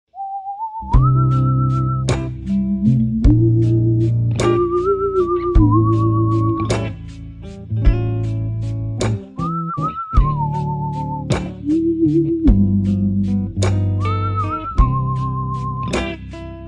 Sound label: Ghost Duet